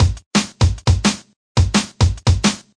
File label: 172 flat dnb, drum loops and breaks; 161 to 180 bpm